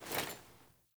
Foley Action Default Sword Throw, Foley Default Clothing; Characters; Player